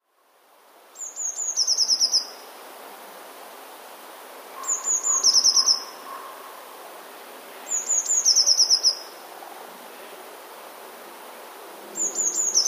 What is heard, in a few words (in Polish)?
spiew